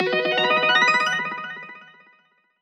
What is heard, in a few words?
sfx UI score tick bonus; Breakout Beyond